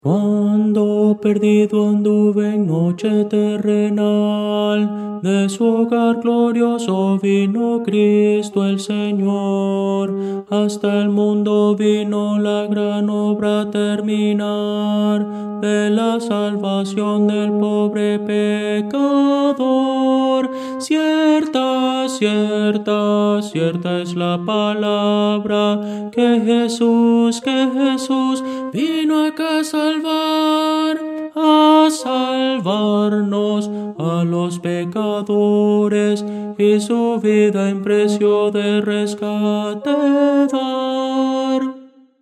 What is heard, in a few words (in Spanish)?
tenor, himnos cristianos